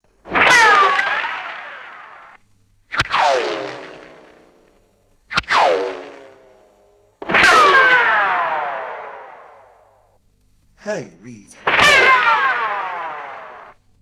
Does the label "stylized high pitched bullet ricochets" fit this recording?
Yes